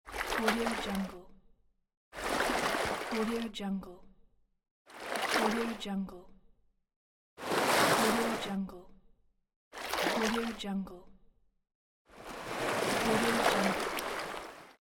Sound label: water splash